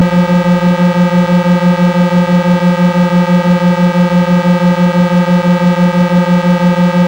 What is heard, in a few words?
deep